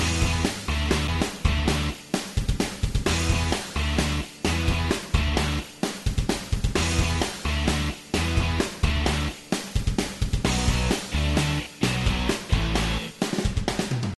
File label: Classic Rock Loop